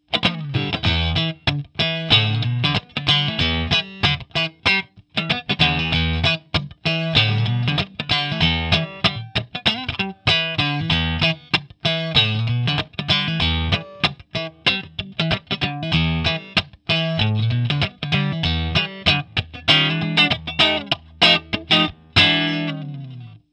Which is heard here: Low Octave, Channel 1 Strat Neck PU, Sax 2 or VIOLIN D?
Channel 1 Strat Neck PU